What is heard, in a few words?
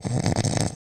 snore; creature